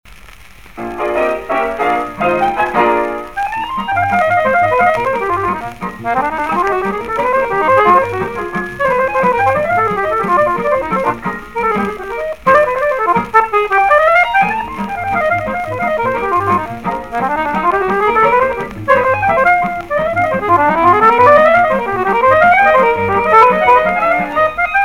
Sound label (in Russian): Экспромт молдавская; Грампластинки 1946-1969 годов